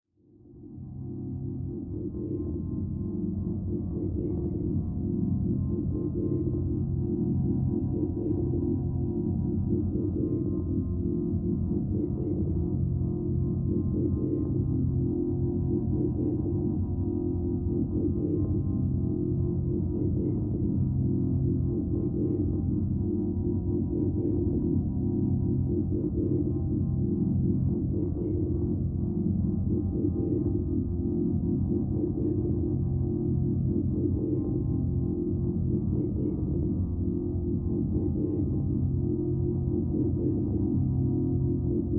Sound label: SFX Tortures Background; this is the police 2